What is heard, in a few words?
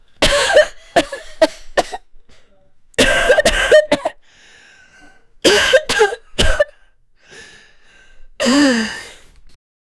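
an old fat grandma gurgling, distress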